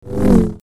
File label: Double Bladed Saber Sound; FXHome